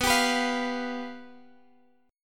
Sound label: G 7th B; G7/B strummed